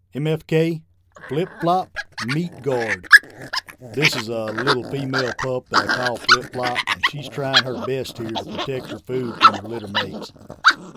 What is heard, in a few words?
FlipFlop Meat Guard, Game Calls